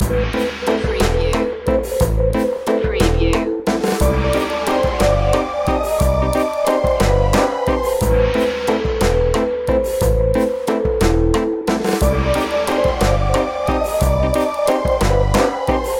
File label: carnival of magic